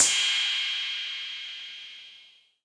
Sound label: Crash 808, Cymbal; One Shots; Drums; Prommer; Ableton Live 12 Lite; Core Library